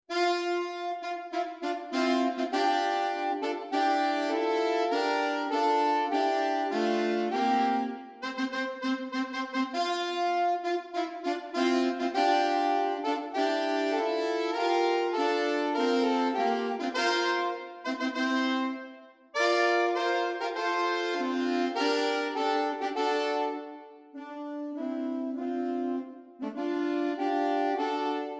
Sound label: Fanfare Grandioso